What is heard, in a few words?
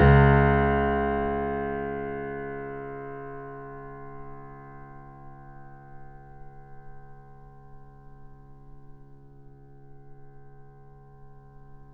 Mp C#1 off, Grand Piano off mic; AKAI S6000 CD-ROM - Volume 2